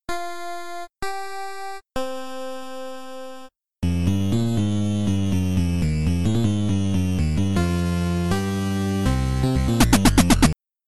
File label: battle intro; space legend; P3D-Legacy; 2.5DHero